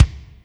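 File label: kick Spike RM, Alesis D4 drum machine; 300 Drum Machines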